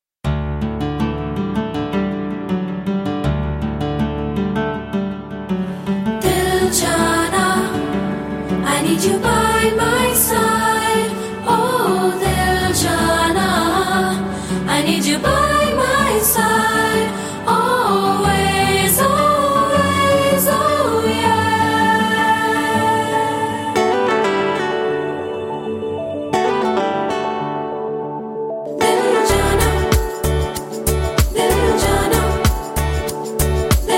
Duet Version; bird idol 2010

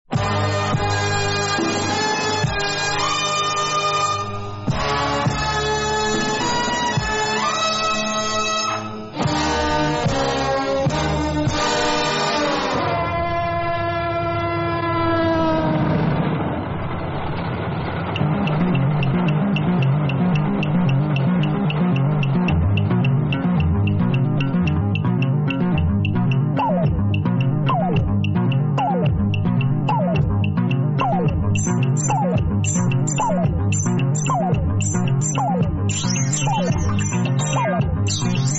Train Theme Instrumental

